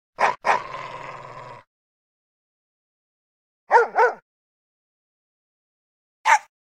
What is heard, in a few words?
Dog Bark; 100 sound effects